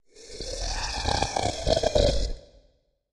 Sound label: Creature Breath